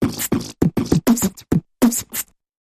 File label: Big Mouth Percussion Variation